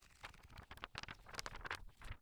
Household Book Hard Cover Book Thumb Through Pages